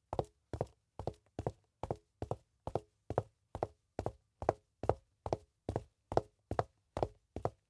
Footsteps Pumps; LOOPERS

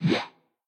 Send Botton; LOOPERS

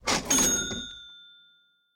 Money; LOOPERS

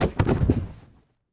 Fall Down; LOOPERS

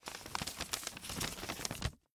Open A Paper; LOOPERS

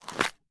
Ring Box; LOOPERS

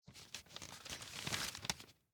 Open An Envelope; LOOPERS

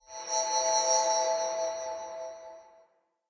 Brightness Falls; LOOPERS

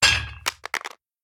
Dumbbell; LOOPERS

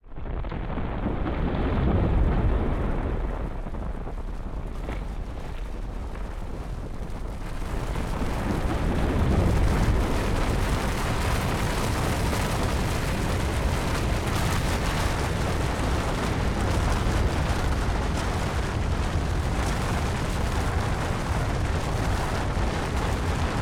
Swelling Sound; LOOPERS

